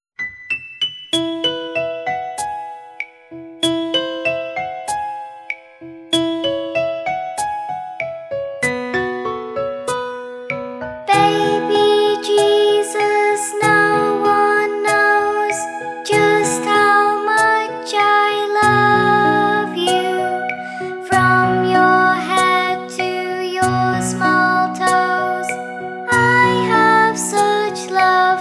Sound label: baby jesus vocals